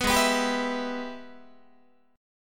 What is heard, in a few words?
Minor Major 7th Sharp 5th, Guitar; G#mM7#5 strummed; JGuitar